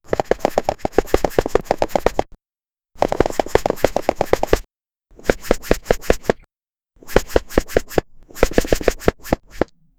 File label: reveal, sound-effects